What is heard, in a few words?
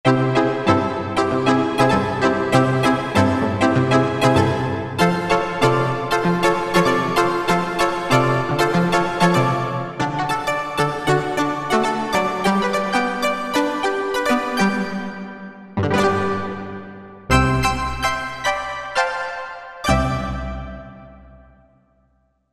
Tiny Pluck, Korg MSC-12S Synth; Synth 3